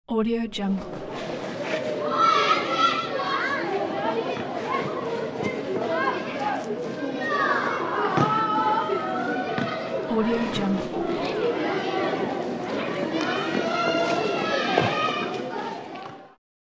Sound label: children crowd